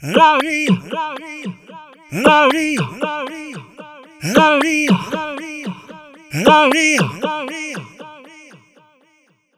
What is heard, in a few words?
LALALI, ADLIB MEN, Funky Vocals; USB Soundscan vol.18; AKAI